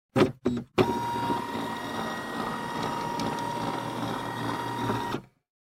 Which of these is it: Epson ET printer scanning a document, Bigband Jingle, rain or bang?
Epson ET printer scanning a document